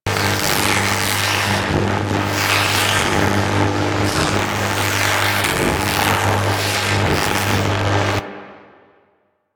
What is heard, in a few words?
Tractor Beam Electrical Generator Loop B; Crp Space Bridge Transition